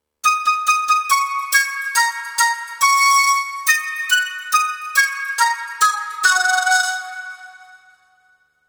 Oriental Stinger, Familiar Chinese style flute musical stinger.; Stingers; Sound Design